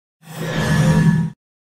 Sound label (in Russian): perehod kiberpank